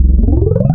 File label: Male Aah Vox, wavetables; Animoog